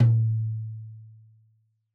Tom2 Stereo, Deeply Sampled Drums

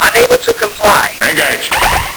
Nintendo Gameboy LSDJ

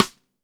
rim shot

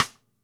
Ludwig Black Beauty Snare Cross Stick; Sonic Foundry; Sony Creative Software; Vital Drums; 1969; Snare One-Shots

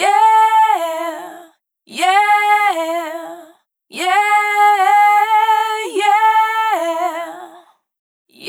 Dry, Multi-Voice Loops, Vocal Samples; Duality B; Sonic Foundry (Sony Creative Software) - Pocket Diva World Class Vocal Samples

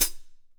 HAT CL; Q Up Arts; Denny Jaeger Private Collection

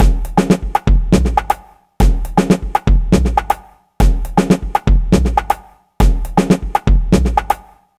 bossynova 120bpm, downbeat dance grooves

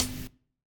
Dance Drums kit; Best Service ProSamples vol.15; AKAI

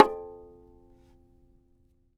TAR; Roland - LA Composer vol. 3

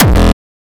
Hardcore kick E min

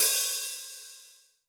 open hihat, Zoom MRT-3B

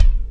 Kick OneShot, Processed Percussion; Sonic Foundry (Sony Creative Software); Rhythmicronics

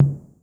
Miami Tom, drum machine; AcidLab Miami

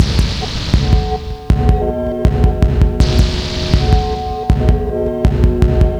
lead slug, 80bpm

